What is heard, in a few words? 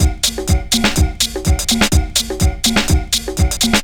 loop 125bpm, House Drum Loops; E Lab; XTc Files of House; 122-134bpm